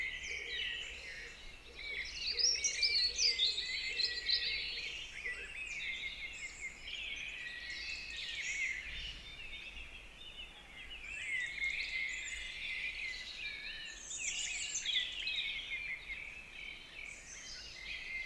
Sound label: Morning In The Forest